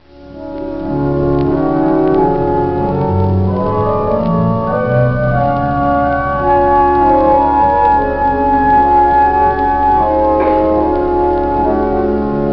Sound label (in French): fonds, puis anches de l'orgue (trompette, clairon, hautbois...); Hosanna; orgue cath oran; la chorale : le début du psaume 150 de César Franck